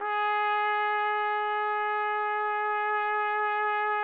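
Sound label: TPT, trumpet; Roland - LA Composer vol. 3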